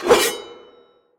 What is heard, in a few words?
star jump; goldentavern